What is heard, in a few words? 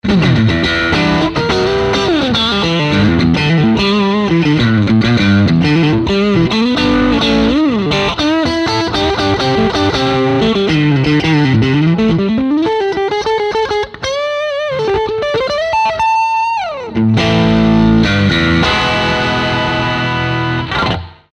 GTS90 overdrive SD1